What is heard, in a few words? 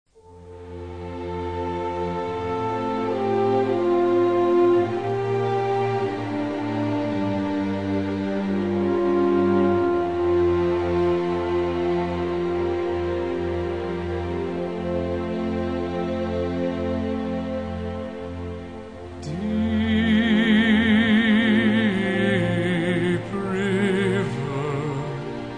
Orchestra Amen Choir, Hymns